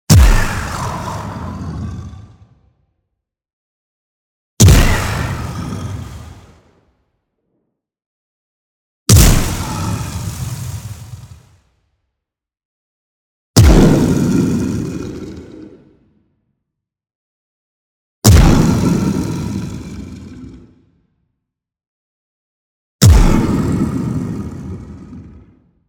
EXPLOSION LONG Creature Growl Breath; boom library; Anime Essentials

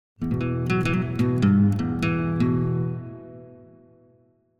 Acoustic Guitar - Acoustic Melody; South End; Hollywood Edge; sound designer's toolkit v2

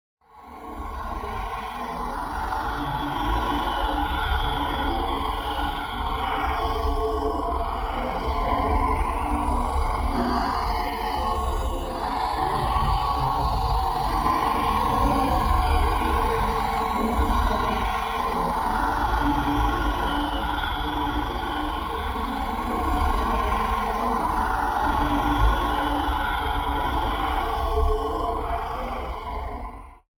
NEON AMBIENCE HIGH; Assimilated; boom library

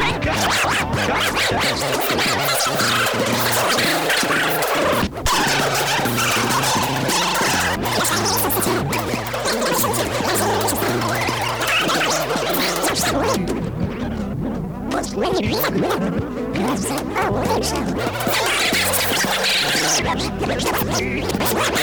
rewinding tape abusively; human; NS Studios - ultra sound collection

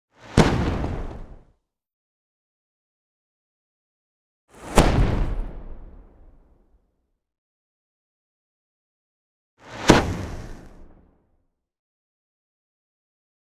Potion Explodes; 10 Versions; Sound Ideas; Series 2000

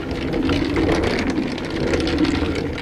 Roll Dolly Wood Loop; SFX Kit; Foley - Misc

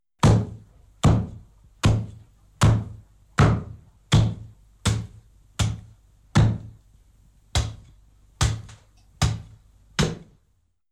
Chopping, Scary Sounds; 1001 Sound Effects; Halloween Horror Sounds Effects

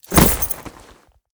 zombie body fall; mayor; DeadFright

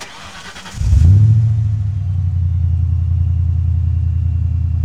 Mustang Start Loop, Automobiles

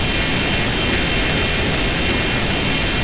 Rocket Missile Loop; SFX Kit; Weapons

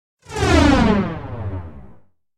Whoosh Fat Phased Going Down Medium; Hollywood Edge; sound designer's toolkit v2

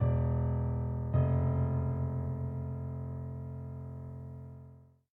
Theme Fm E F; DeadFright

